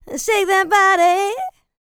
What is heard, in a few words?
LICKS, Vocal R&B Dance; LT DANCE